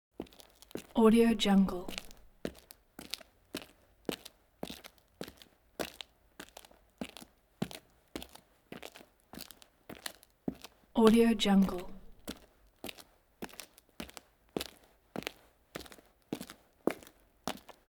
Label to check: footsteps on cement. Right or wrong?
Right